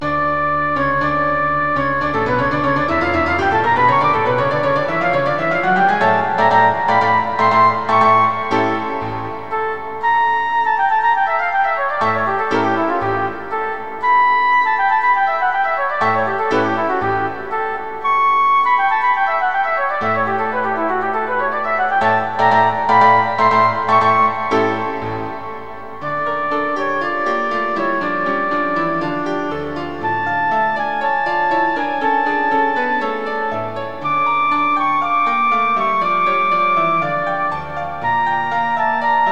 sonate fuer floete und klavier 1 satz

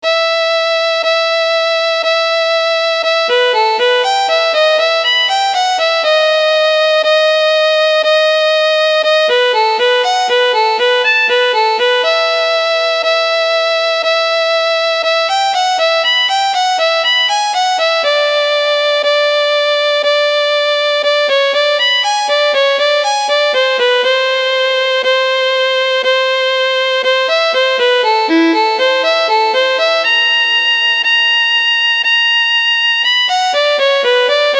violine solo; g dur; vivaldi; rv 310

